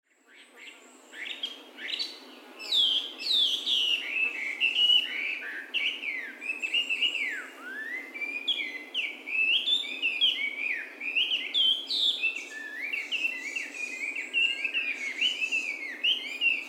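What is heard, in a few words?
gabi, Chinese Hwamei; koe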